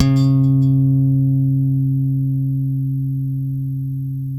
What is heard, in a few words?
FUNK, Bass Legends; Spectrasonics